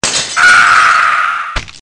Defenestration

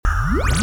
Téléportation

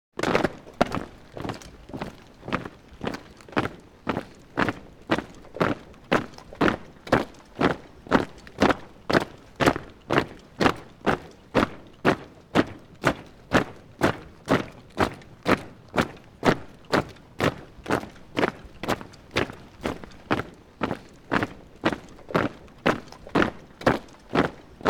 Soldats marchant au pas

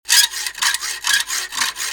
Scie; bruitage; Outillages

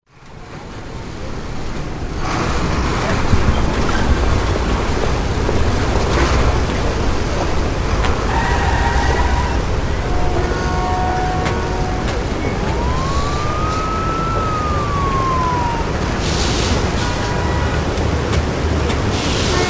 Ambiance ville; bruitage

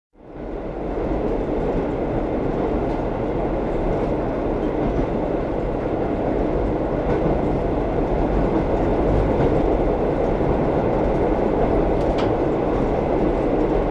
Interieur train

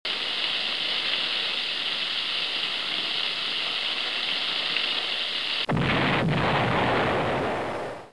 Poudre, Armes; bruitage

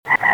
Grenouille, Animaux; bruitage

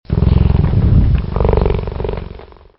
Fauve qui ronronne, Animaux; bruitage

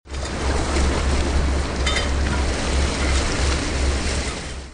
Ambiance port; bruitage

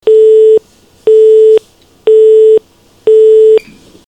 Tonalité

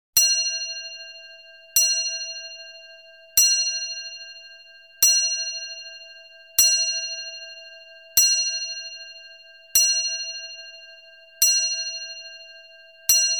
Carillon de minuit

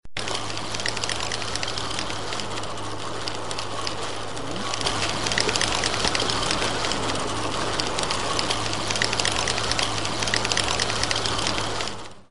Moulinet; bruitage; Outillages

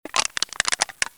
Ecrasement canette; bruitage; Liquides

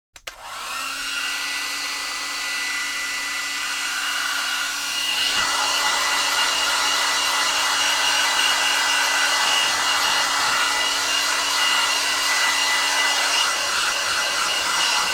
Sèche cheveux; bruitage; Electro-menager

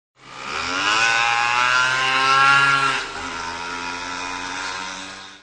Mobylette

